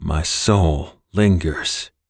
Death; Dante

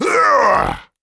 Viking Death

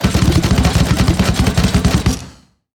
tower tricannon skill bombardment

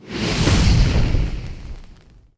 liquid fire chimes; faustus

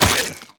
enemy death; sfx; evolving Scourge

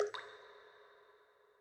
Ambient water Drops

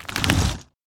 stone druid boulder summon

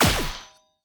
tower basic Attack; elven Stargazer

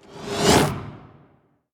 fist charge

